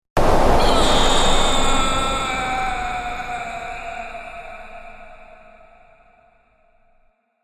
Sucked Into Space Death, Man screams when sucked through space airlock.; Sci Fi Voice